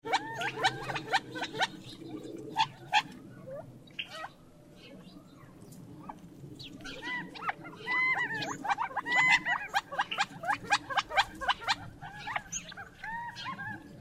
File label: gambels quail